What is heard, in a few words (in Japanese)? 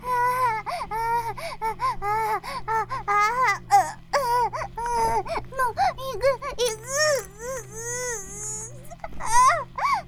バイブ放置, ASMR